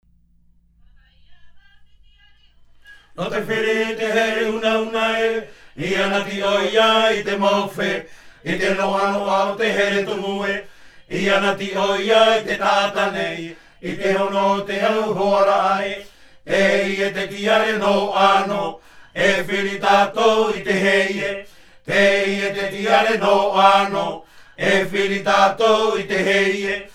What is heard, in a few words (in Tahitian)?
Himene tarava Tahiti